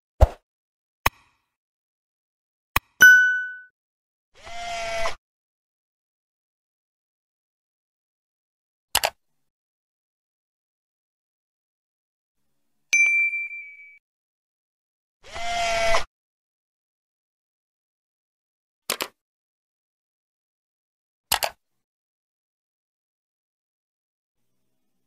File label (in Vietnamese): am thanh nhan nut dang ky subscribe button